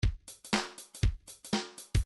reggae 2 bar

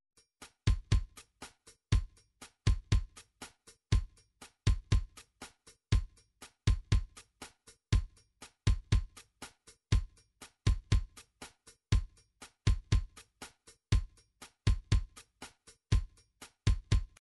drums, midi drum patterns

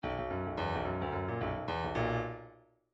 midi grooves funk

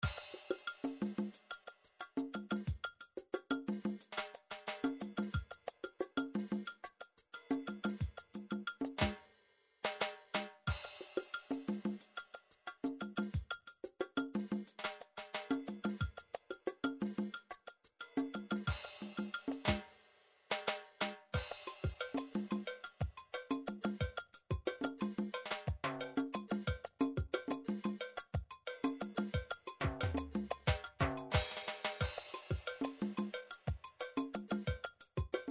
drum midi salsa

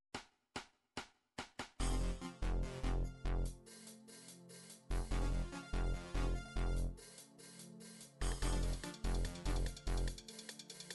abertura show euro beat, midi

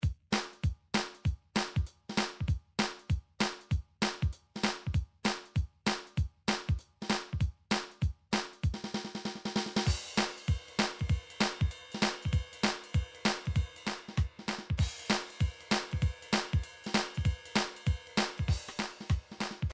drum midi files rock

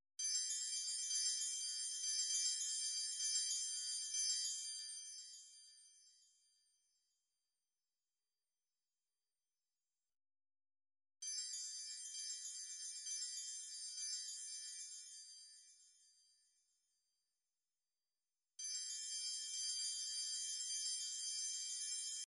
midi drums

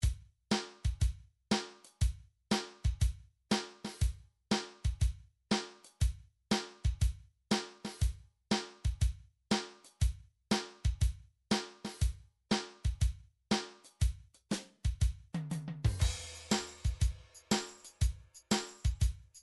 drum foxtrot

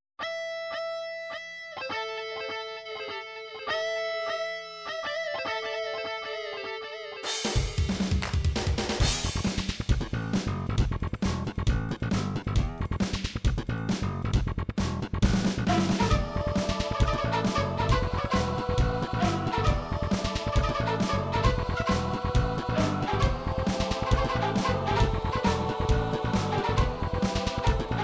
midi drums drums funk

